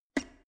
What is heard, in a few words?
plastic water boiler open lid; maschinen; wasserkocher